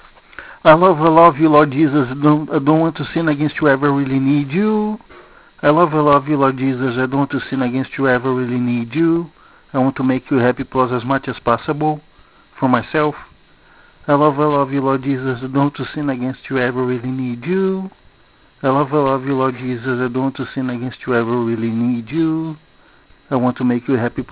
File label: just voice